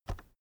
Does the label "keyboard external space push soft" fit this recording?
Yes